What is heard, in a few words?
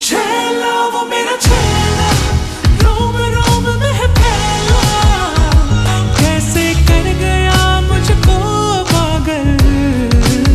Ringtone Slowed Reverb